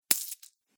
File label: Treasure Coins Drop; Cartoon Sound Design